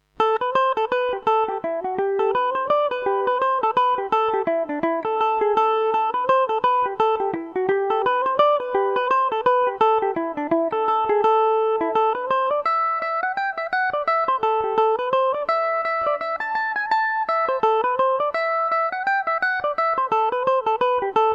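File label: A dorian, irish mandolin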